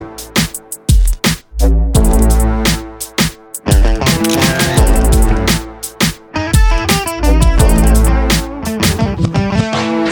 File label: Instrumental